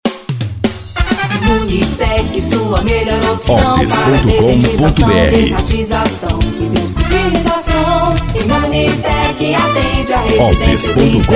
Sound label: jingle